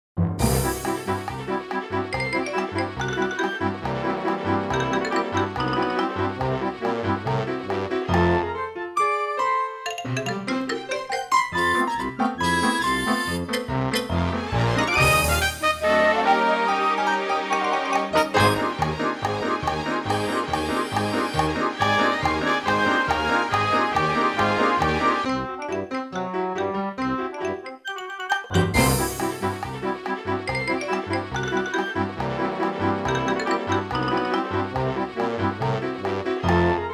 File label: childrens